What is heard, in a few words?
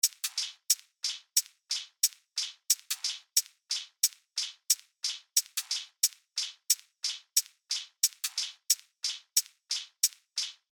Lofi Hihat Loop 90 BPM